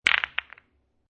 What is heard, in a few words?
Billiard Pool Snooker Billiard Spread Shot; Sports; Lynne Music